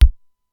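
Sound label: Simmons SDS-9 bass drum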